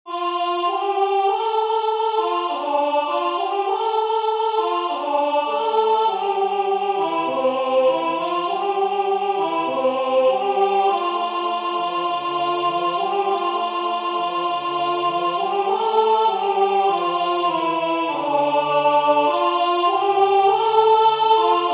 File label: Alto; A drop of Nelson's Blood; Toronto Choral Society